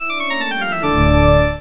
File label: Organ of Doom; Frog Xing 1.1